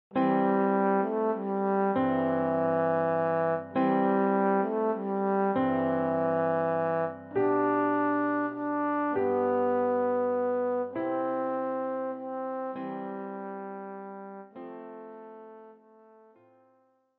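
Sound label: Silent night, Posaune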